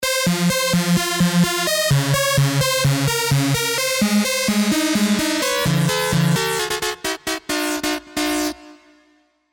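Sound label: synth, spire old skool; Virus C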